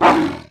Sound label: tiger attack; mir2; crystal